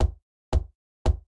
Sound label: Knock Soft; blue archive